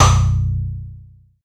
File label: doumpoc